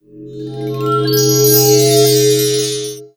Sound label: Skill meditation; FlyForInsanity